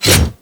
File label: Ghoul Devourer Melee Swipe; Warframe; Grineer Ghouls